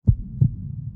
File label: heartbeat